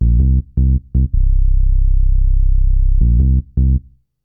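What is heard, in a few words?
synth bass, ambient, 160, C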